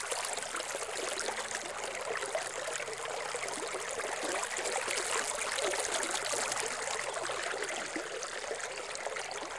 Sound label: water stream